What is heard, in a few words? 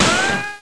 pinned